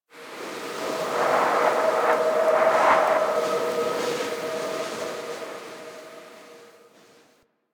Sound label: Landscape Wind Gust Med, Ambience; One Offs; Warframe; Venus Open World